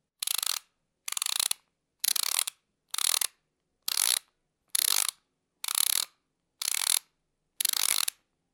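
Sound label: ratchet sound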